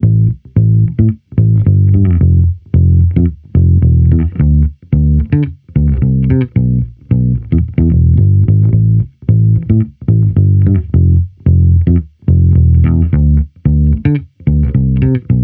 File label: Amped loop 110 Am, Electric Bass Loops; Slate Digital BASSHOLE Sample Pack